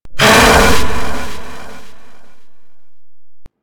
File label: Golem Growl; Warframe